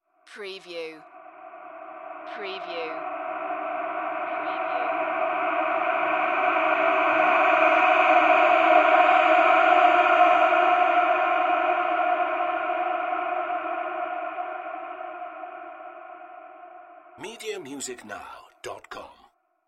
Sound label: Scream Effect Reverb; sound effects bible